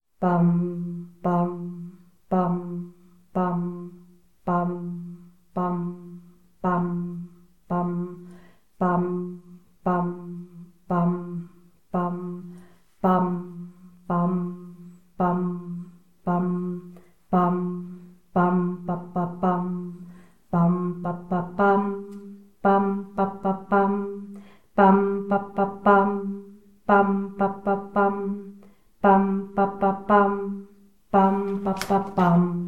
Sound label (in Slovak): bass; Farnosť Letanovce